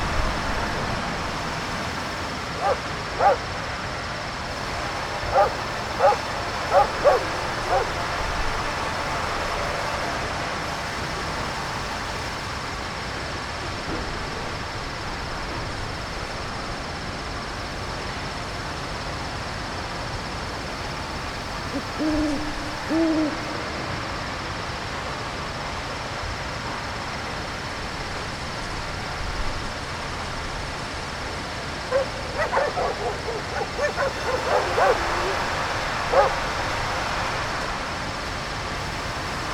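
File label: ambience timberyard; Pilot Down Behind Enemy Lines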